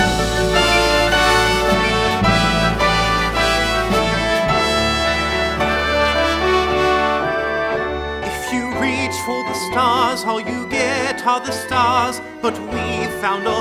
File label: Audition Cut with Vocals